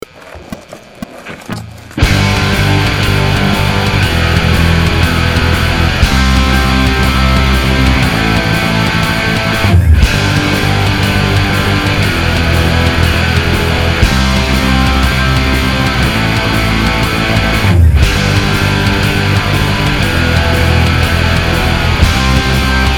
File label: Power Chord Drill